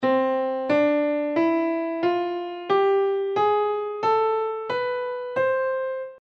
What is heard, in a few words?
bebop major scale